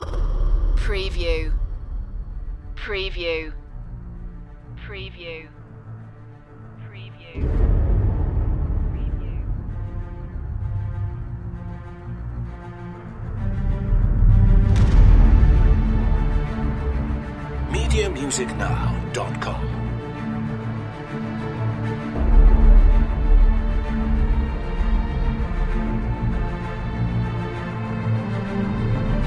underscore

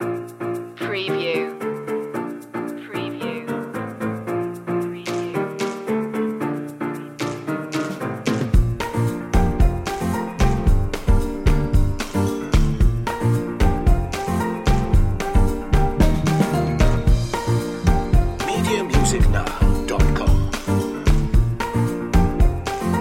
fruit soda loop

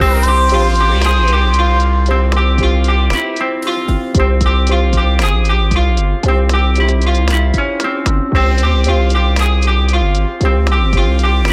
desert market loop

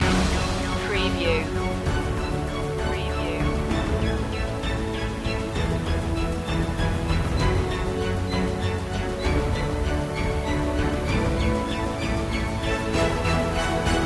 no choir

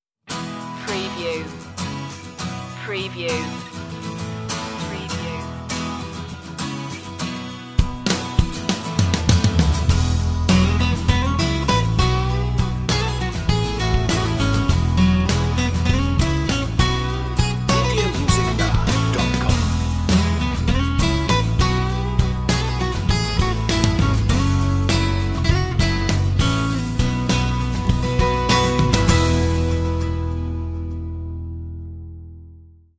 unplugged

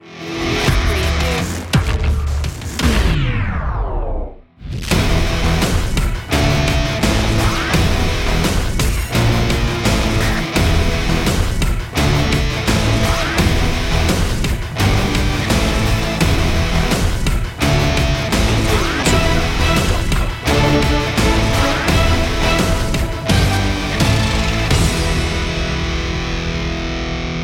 hip hop

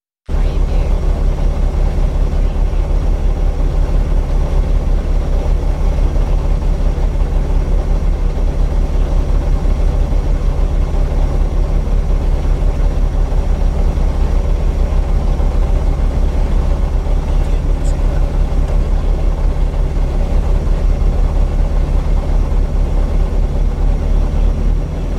Rally car tickover; beanstalk audio

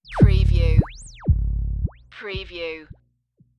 Long Zap

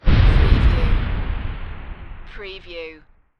Fighter Jet Booster Rocket

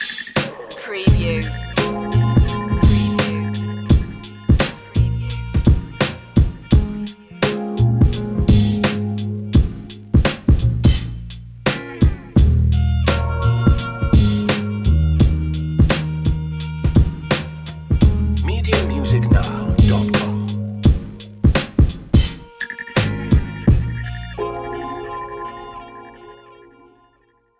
stoner beat

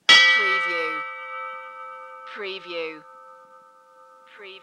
Bell Ship Antique Single

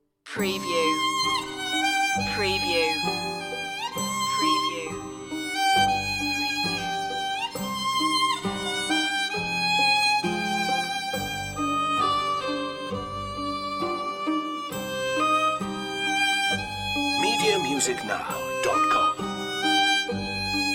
solo violin and guitar

